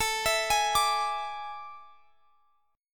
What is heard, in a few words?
A Major 7th Suspended 4th; AM7sus4; arpeggiated; x,x,19,21,21,22